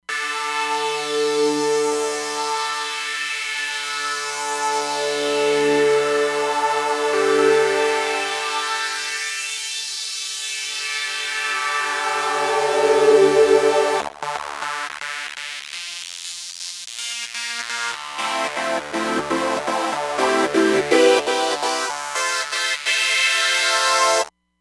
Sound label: HPF Sweep, Roland Fantom XR